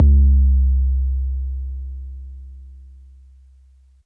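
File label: bass drum 808 MPC60 Noise C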